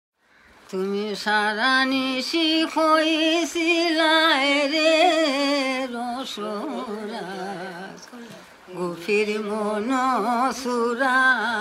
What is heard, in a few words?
Duet